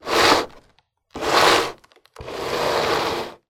foley plastic tub small drag scrape on wooden table various; zapsplat